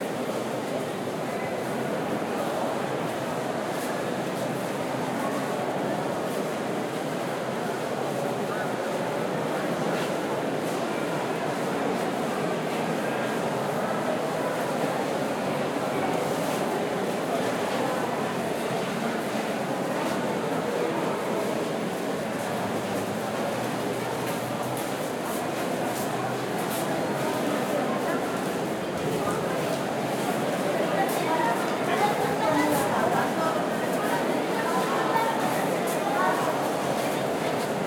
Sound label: Neutral Interior Walla, Hushed Voices, Reverberant; CRWDWalla; Jake Fielding